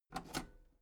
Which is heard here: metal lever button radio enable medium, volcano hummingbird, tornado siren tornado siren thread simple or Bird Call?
metal lever button radio enable medium